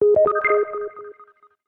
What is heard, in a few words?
Idea, notifications; LG G Vista VS880